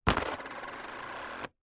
money coins x2 australian 2 dollar coins set down on plastic lid and spin